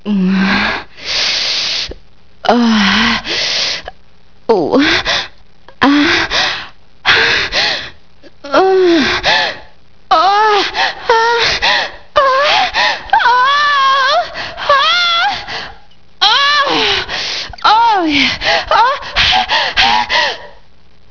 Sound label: moan